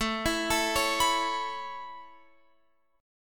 A Major Add 9th A Guitar Open F, chord; x,16,16,16,16,18; Aadd9 arpeggiated

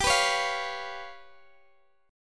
G 7th Flat 5th, Guitar, Open D

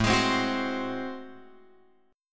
A Minor Major 13th; {5 X 6 5 7 8} strummed

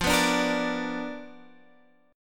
B Minor Major 7th Flat 5th, chord

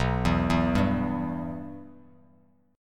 B Suspended 4th Sharp 5th B Bass Standard; Bsus4#5 arpeggiated; JGuitar

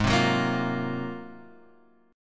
Ab 13th Ab Guitar Open F; strummed; JGuitar

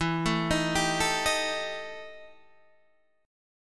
E Minor Major 7th Double Flat 5th, Guitar, DADGAD; chord shape

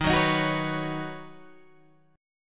Eb Minor 7th Sharp 5th Eb Guitar Open G

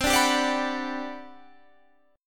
G Major 7th, C, Gmaj7/C chord; strummed

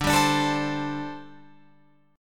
D 7th Suspended 4th, down, Guitar, Open F

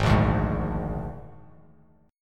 Ab Suspended 2nd Flat 5th Ab, Bass Standard; strummed; JGuitar

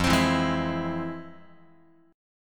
F Minor Major 7th, Guitar, chord; FmM7 strummed; JGuitar

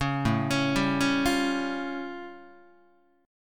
A Augmented 7th A Guitar; arpeggiated; 4,5,4,5,5,4; JGuitar

